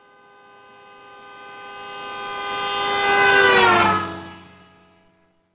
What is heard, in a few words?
doppler